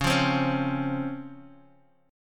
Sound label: C sharp Major 7th C sharp, Guitar Standard; strummed; chord; x,4,3,5,2,x